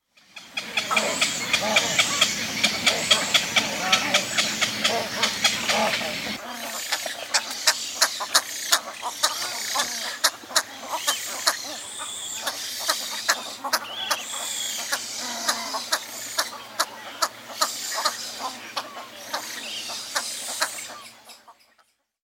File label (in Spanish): garza de ganado; Los Colores que Cantan